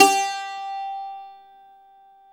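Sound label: bouzouki F G 2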